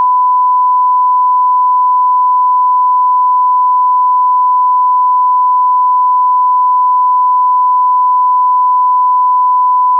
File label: Sine 1000Hz